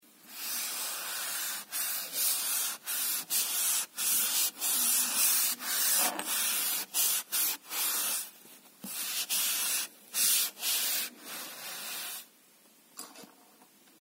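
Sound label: wiping board with sponge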